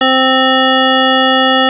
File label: synth, SQ80